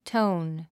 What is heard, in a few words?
tone